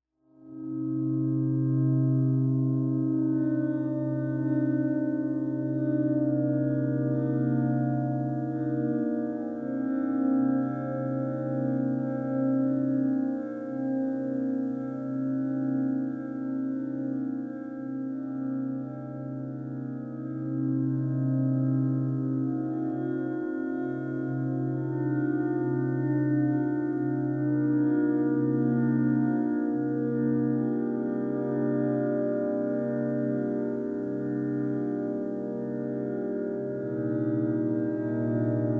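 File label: Acoustic Sketch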